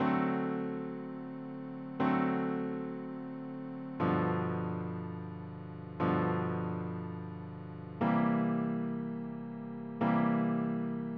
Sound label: rhythm changes B